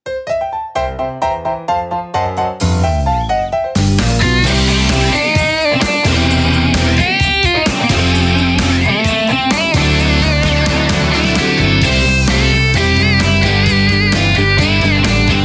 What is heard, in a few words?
50 60 rockin